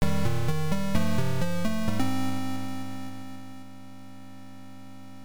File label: Scenario Clear, msx2 psg; Sorcerian